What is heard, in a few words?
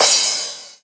Crash, Cymbals